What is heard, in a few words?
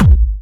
Medium, kicks